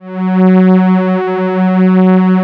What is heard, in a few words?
Atmo Pads; Best Service; XX Large